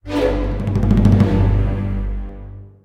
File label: Stinger Battle Suspence; Stinger Padana Battle Suspence; bless